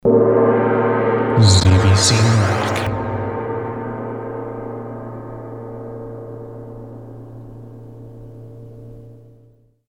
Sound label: gong